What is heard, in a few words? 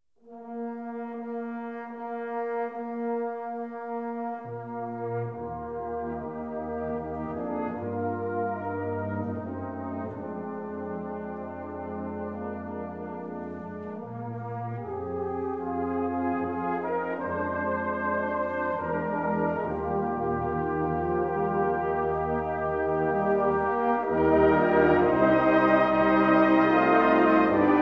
Band